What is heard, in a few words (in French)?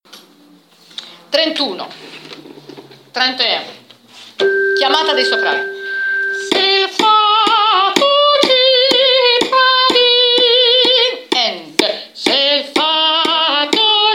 chanté pour les alti